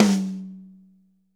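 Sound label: high tom; 90s sample CD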